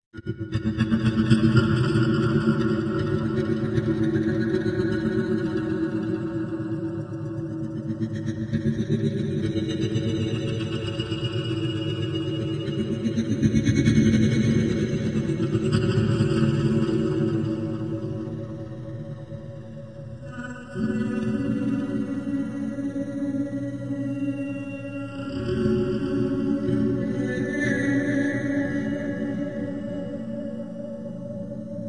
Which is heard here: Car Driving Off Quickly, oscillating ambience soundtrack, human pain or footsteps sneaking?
oscillating ambience soundtrack